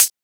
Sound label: Closed Hat; FLPRO